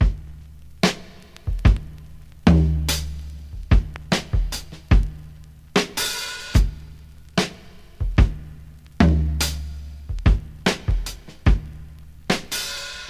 73 bpm breakbeat g key, Free drum beat - kick tuned to the G note. Loudest frequency: 1252Hz; drum loop